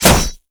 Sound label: Spear Metal, Damage